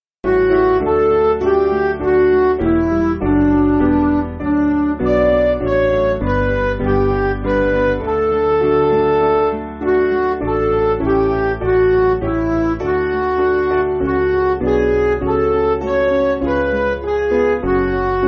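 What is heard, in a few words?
Midi, Music Backing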